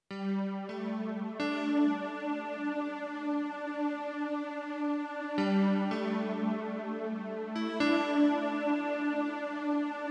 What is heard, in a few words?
CP70